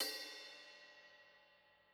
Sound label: Crash, drum